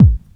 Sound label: kick dance, techno trance drums